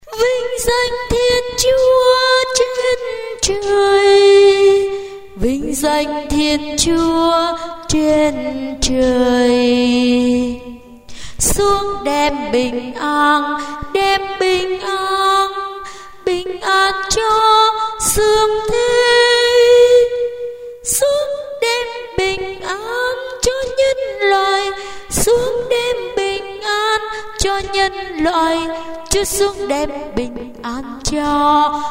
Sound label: alto